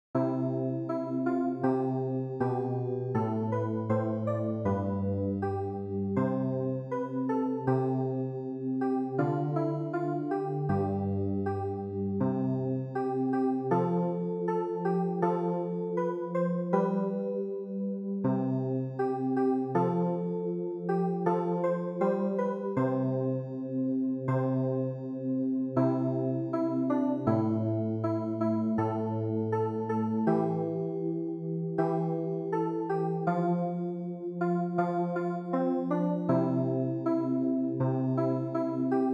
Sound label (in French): CLAVIER